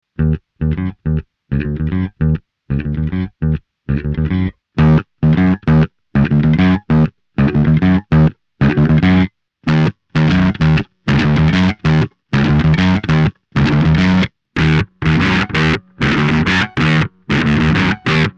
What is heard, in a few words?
Bass